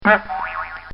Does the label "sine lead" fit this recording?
No